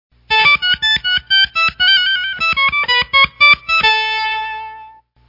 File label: ring; doubler1-octave UP!